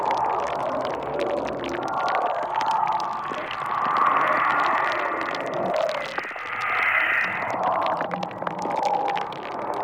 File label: Vassal Corruption Loop; Characters; Enemy; Mendicant; MndKing; Abilities